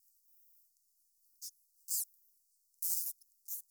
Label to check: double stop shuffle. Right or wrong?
Wrong